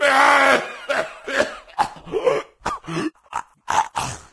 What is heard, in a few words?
wolf; cstrike